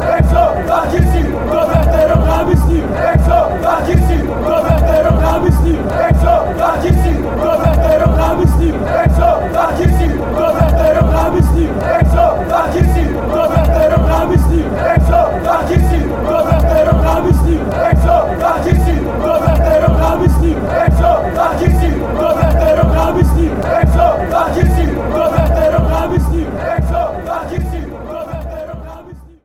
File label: greece chant